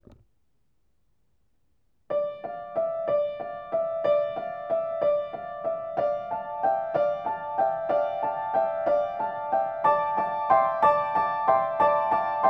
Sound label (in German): Improvisation; EG 110 Die ganze Welt; epiphaniaskirche